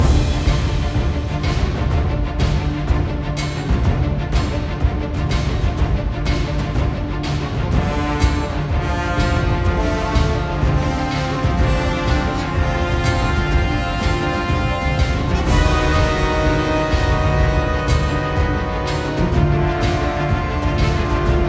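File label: background music boss battle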